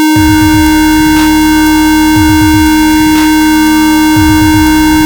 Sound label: Noisy